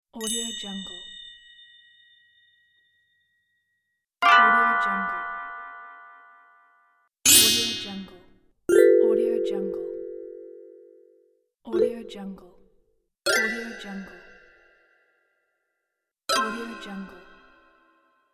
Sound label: interface sounds; dulebas